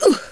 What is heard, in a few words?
Bodyslam; Lara; Playable Tutorial Level; TR1